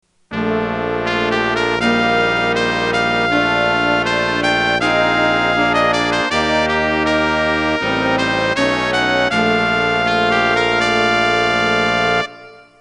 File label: opening brass